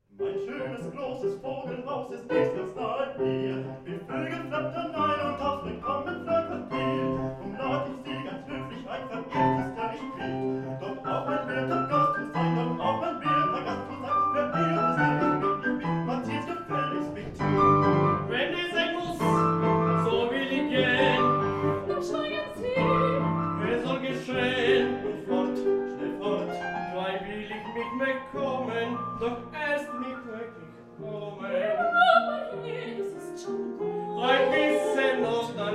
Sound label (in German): Terzett; Meisterkurs